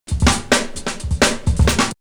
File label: Beat; AMG